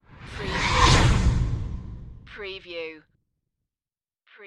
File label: Spaceship Pass By